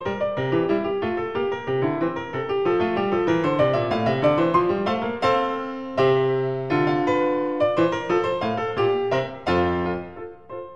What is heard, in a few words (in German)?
konzert c dur fuer zwei klaviere allegro